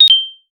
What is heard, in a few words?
pick up experience gem fo, gameplay